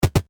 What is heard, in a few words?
Video Game Footsteps Run Loop Vintage Eight Bit; Technology; Video Games